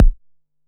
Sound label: 808 BD short, Roland-808 Drum Hits Bass Drum